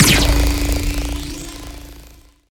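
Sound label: Sci weapon Shot Beam Medium Metal Melter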